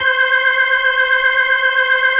C5F, B3

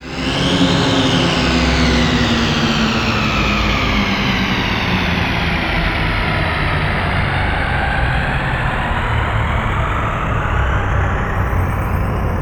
SUB WAVE, Special FX Twisted

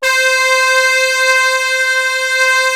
SAX ORAS S C4; USB Soundscan vol.49; Analog Fantasies; AKAI; PROPH

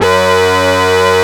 ROLAND JX3P SYNC F#2; Big Fish Audio - First Call Vintage Keyboards

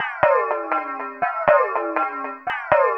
SYNTH AQUA; AMG - Vince Clarke Lucky Bastard

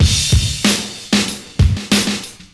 CYMB, Breakbeats; USB Soundscan vol.46 - 70 s Breakbeats; AKAI

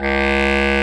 WND, CB Clarinet; Roland LCDP04 Orchestral Winds; Lo Clarinets

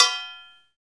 CowBells

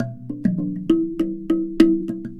Box Drum Celebrate, Worldly Rhythms; Sonic Foundry (Sony Creative Software); Ma Ja Le Ethereal Textures

